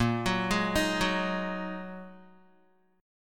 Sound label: Bb 7th Suspended 4th Sharp 5th, Guitar; arpeggiated; 6,9,6,8,6,x; 4E,4A,5D,5G,5C,6F; Bb7sus4#5